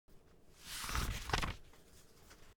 mag flip; items

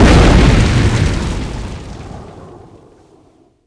flare hit bod; weapons

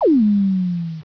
barrier; items

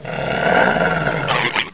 growl; sven; zombie

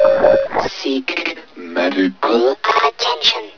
zombie idle; sven; zombie hev

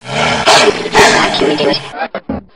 gonome melee; gonome hev

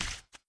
shock step; stepsounds

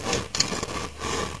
push box; debris; aomdc

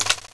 flare gun draw; weapons

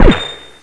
desert eagle sup, weapons

